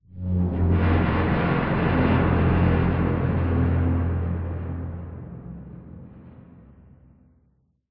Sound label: amb apocalypse wronk, ambient city